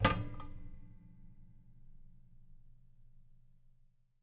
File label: Colide